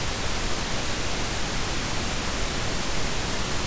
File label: external mic sound